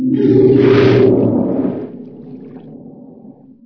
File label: water growl, ichthyosaur; Source Engine; video game; npc